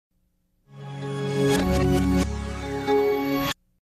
intel logo

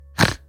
snort

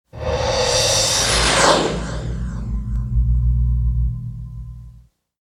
hi fi stereo

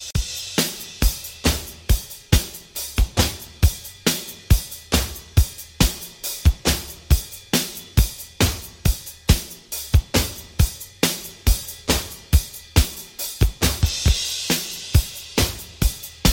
drums

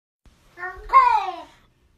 im cold cat

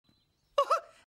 ah ah; mickey

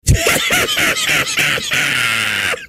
laugh

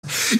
breathing fast

